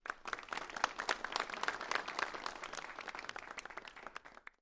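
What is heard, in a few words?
Crowd 50 Short Medium Burst, small interior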